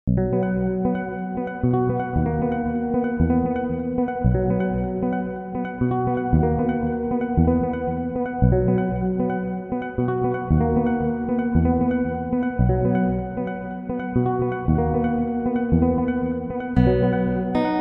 TASSMAN WOW

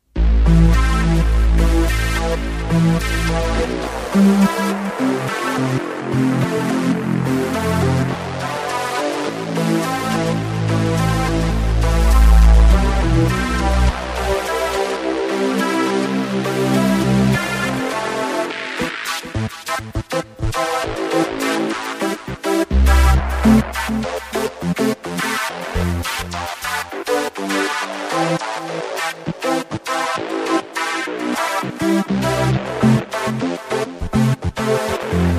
S90 HIGHPASS

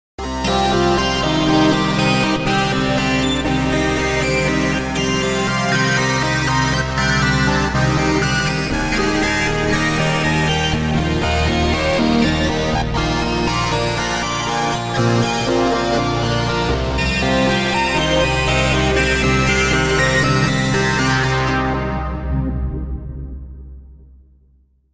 LFO SYNC; VIRUS TI

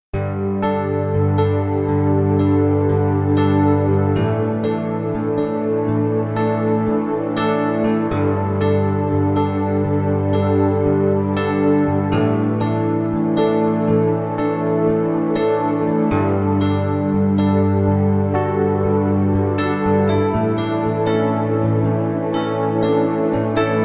NS2 PIANO

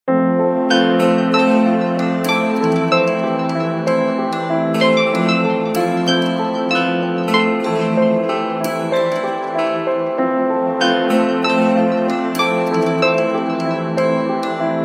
PIANO

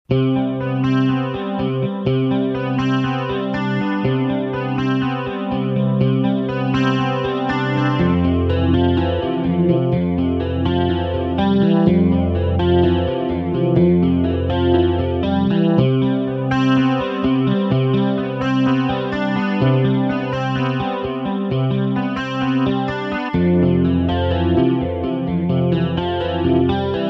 S90 CLEAN

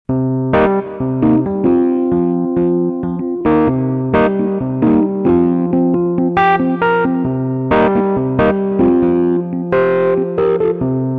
VINTAGE BLUES; S90

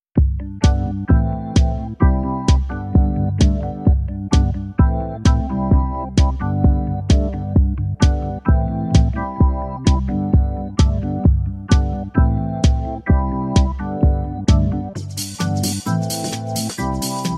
OR SLOW JAM

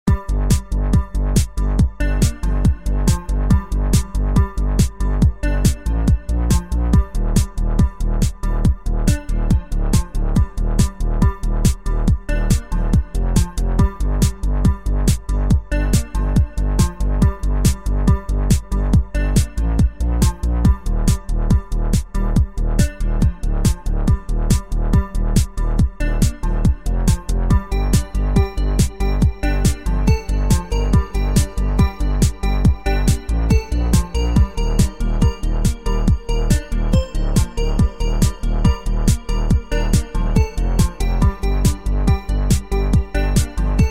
MASSIVE DANCE

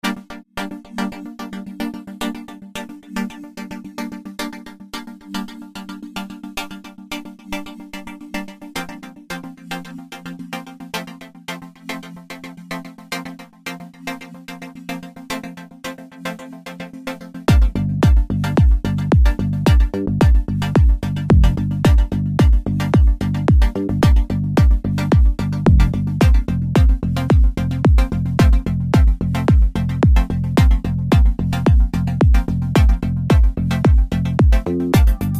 PAD

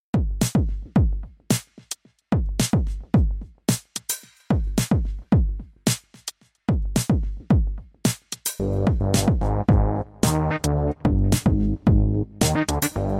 BASS

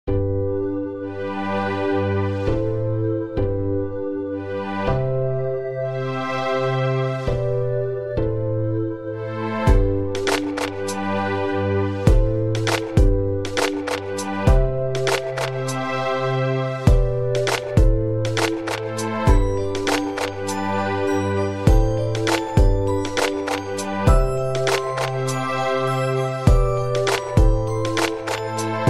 WAVESTATION WITH LOVE

